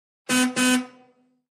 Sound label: 33 Pontiac Horn Blow; 1933 pontiac